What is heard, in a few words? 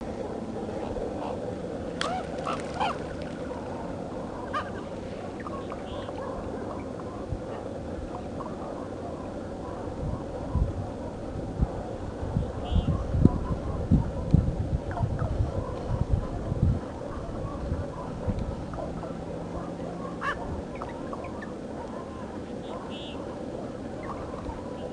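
C corax song background B clangula displays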